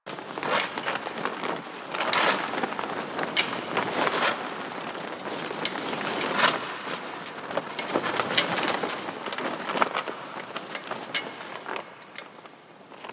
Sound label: Tarp Rustle Loop; Warframe; Ambience; Nineteen Ninety Nine